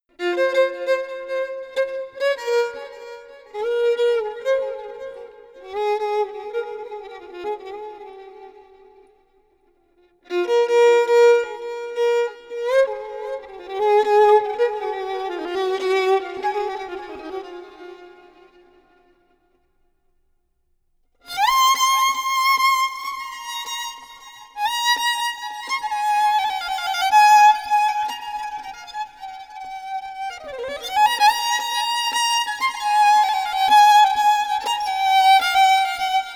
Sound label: Solo Violin